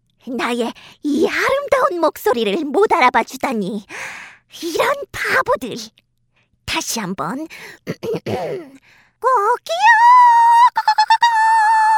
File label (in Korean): 수탉